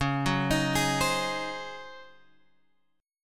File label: Csharp Major 7th Suspended 2nd Suspended 4th Csharp, chord; C#M7sus2sus4 Chord {9 9 X 8 9 8} arpeggiated